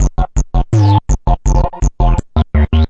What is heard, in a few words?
deep gate bass